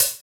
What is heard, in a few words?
snappy pedal hi hat one shot g sharp key